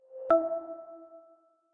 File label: setup step, homepod